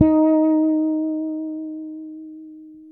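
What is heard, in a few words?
D#5, fretless, bass; Spectrasonics; Bass Legends CD 1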